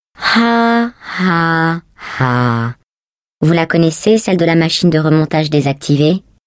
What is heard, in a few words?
laugh, fr; GLaDOS; taunt